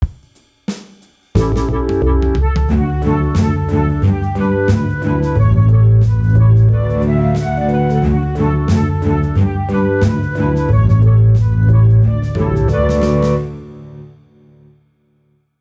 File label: bass performance tempo